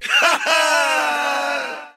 ha ha

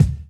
richter, Kicks Medium

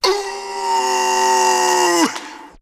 "ooh" snap; Voice

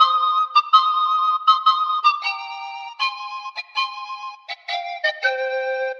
flute; Phonky

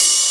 Hats Electro Open

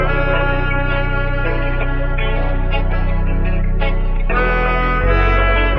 sax and guitar smooth jazzy; Loops; Phonky

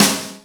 snare, Real

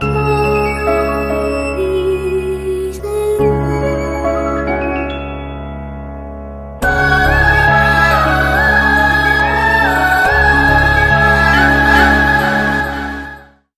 voice, Sad

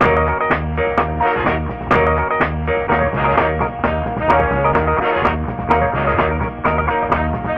horns, Phonky loops

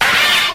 clattering

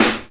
infection, snares knocks

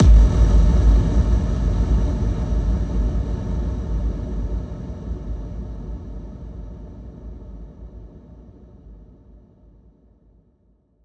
electric explosion; Crash Bang Boom

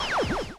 space, Zaps and Beeps

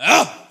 growl, Voice

